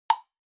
Woodblock Low

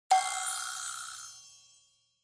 Percussive Accent Dry

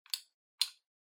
Megaphone Hardware Push Switch

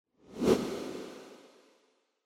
Camera Motion, High Effect; シーン・カメラモーション; 高 エフェクト

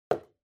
Spice Mill Put

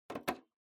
PC Hardware DVI Unplug

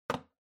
Music Box-Foley (Put Down)

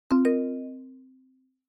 OS Sound Notification

